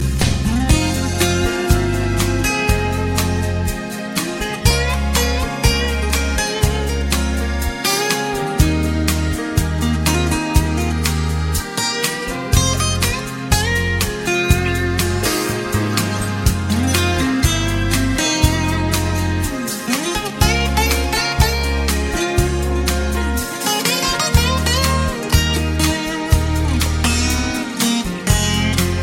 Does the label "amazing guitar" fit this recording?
Yes